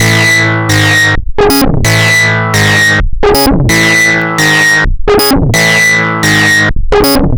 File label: Lead